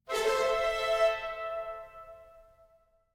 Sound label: Cutscene Positive Sting; Arcta